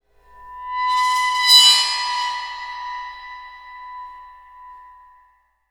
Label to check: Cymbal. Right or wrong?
Right